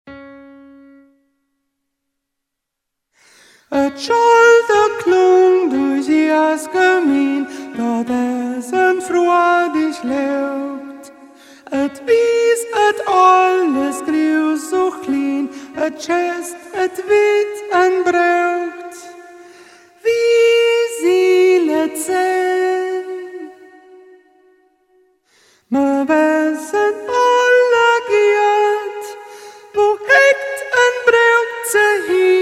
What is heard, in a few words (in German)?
Sopran, chor